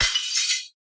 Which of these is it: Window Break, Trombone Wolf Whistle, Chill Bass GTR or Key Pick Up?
Window Break